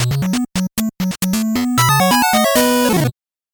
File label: 8bit jingle normal